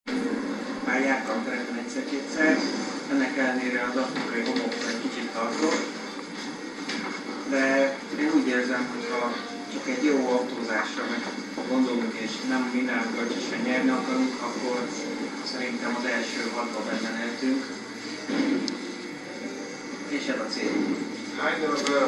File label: digitar